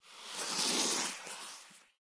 Marker Word; miside demo windows gamerip 2023

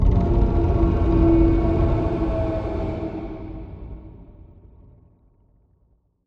Music Stinger; HDF Ch2

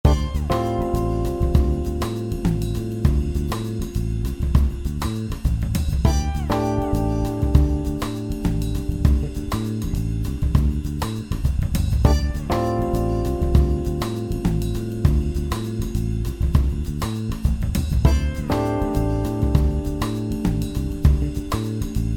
Menu Loop; clue; android

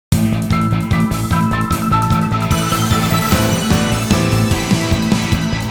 Nest Battle Small intro; vivid knight switch windows gamerip 2021

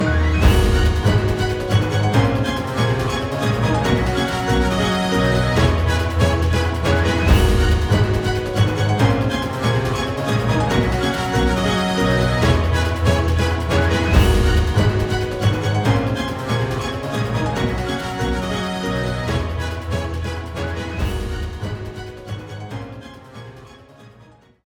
Push Forward (Rune Critical Hit)

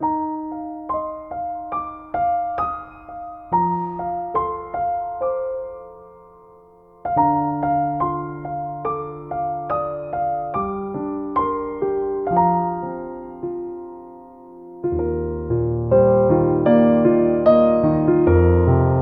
Piano Segment; Finding Paradise; Kan (Reives) Gao

